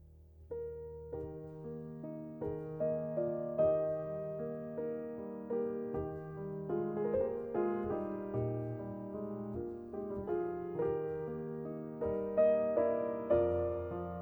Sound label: Piano; Franz Schubert; Three Piano Pieces D.946; No.2 Allegretto